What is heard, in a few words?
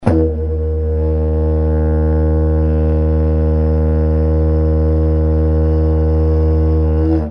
drone